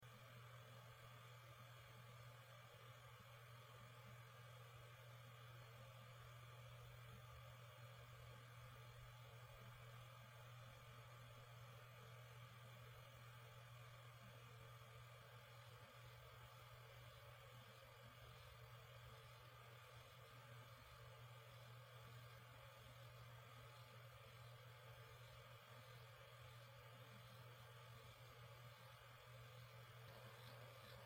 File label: hitachi t7k500